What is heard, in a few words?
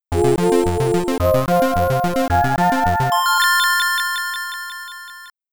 Obelisk Destroyed; Archipelagos; Amiga